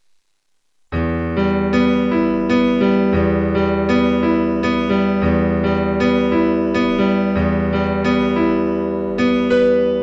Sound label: virtual piano